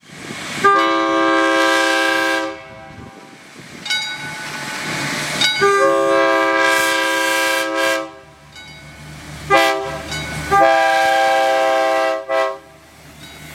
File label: Diesel, horns; trains